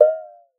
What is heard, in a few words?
water; Xsample VOL-9